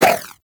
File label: Infested Throwing Star Whoosh; Weapons